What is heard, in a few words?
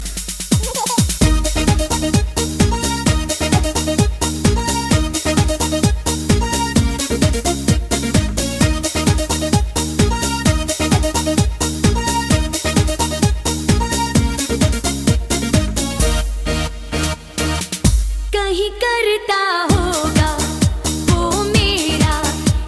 Music